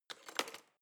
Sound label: knife wood drawer handle slide